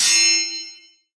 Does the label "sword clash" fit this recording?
Yes